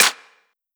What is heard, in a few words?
Perc Clappy, 909 Percs; Slate